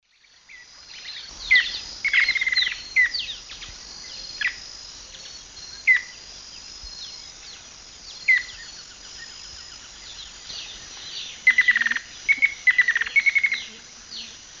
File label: Myrmochanes hemileucus, duet; S. America; Eastern Lowlands